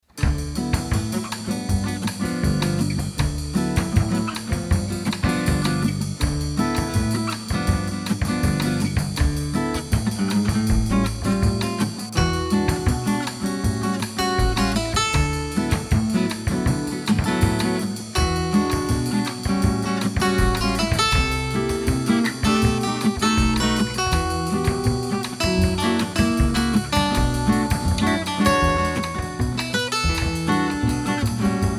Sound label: Untitled Bossa D